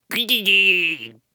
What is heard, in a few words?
Ice Mage Attack Vocals